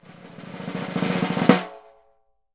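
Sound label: snare, Synclavier Percussion; Synclavier Percussion 2; World & Orchestral; ILIO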